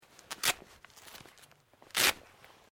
JACKET SKI SLEEVES VELCRO, Movemement sounds for various articles of clothing; Skiing And Snowboarding Sound Effects